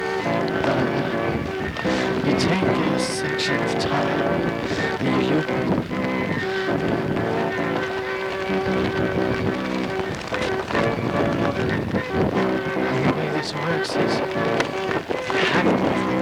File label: hammond